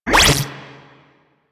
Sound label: Spectra Stop 2D, weapons